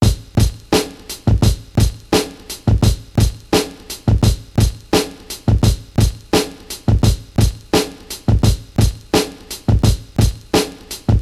86 bpm breakbeat sample g sharp key, Free drum loop - kick tuned to the G# note; drum loops